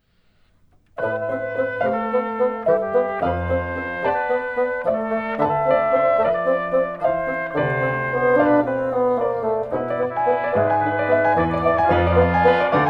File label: backing